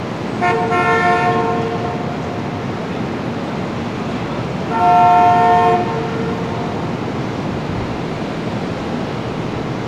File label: NEW YORK, City Ambience; SFX